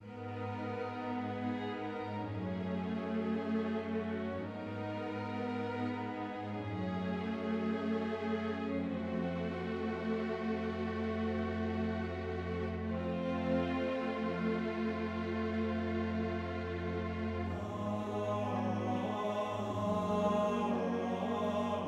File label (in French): orchestre audio avec voix; orchestra e voci sintetiche